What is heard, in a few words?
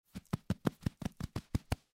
Sand Pat Hand Multiple Pack Down; Playgrounds; foley; SoundEffects-Blastwave